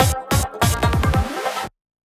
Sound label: FILL, PsyTrance